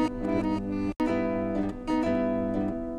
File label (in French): GUITARE